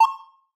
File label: sonar hazard; Golden Crayon Sounds